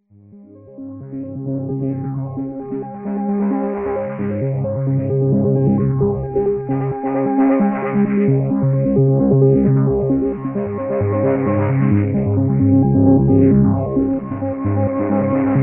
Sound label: echo modulation